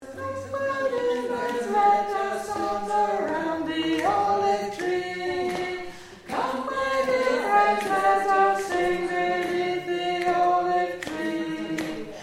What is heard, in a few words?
Tenor Chorus